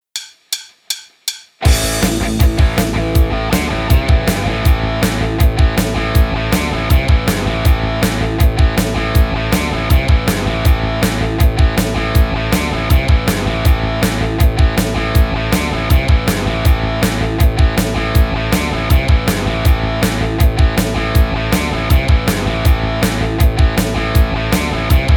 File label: jam track, guitar; emir hot; lick of the week no 19